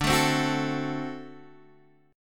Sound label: D 7th, down, Guitar, Standard; D7 strummed; JGuitar